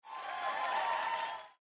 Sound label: Cheer